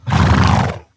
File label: snort, horses